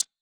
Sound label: Tech Drum Hit, techno 120bpm; musicradar; Drum Hits